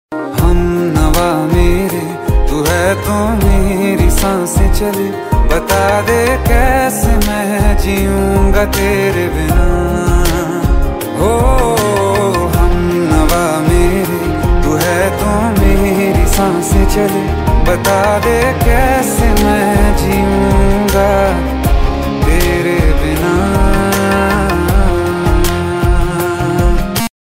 New Hindi Song Ringtone

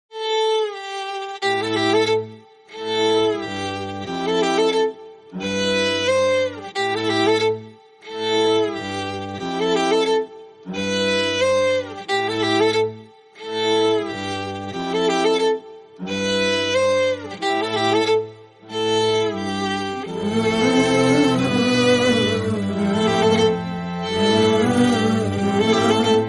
Love Theme Violin Ringtone

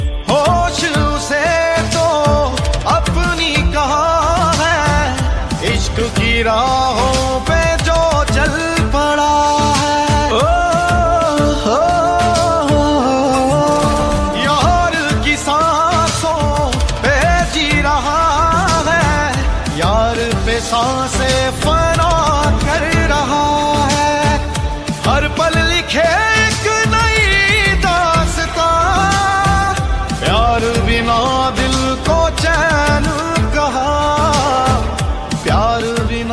Sad Song Ringtone

